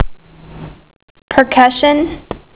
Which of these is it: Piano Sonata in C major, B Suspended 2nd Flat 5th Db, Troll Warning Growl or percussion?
percussion